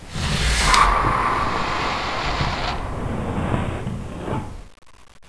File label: alien door